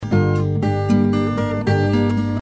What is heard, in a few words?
C, Em, Guitar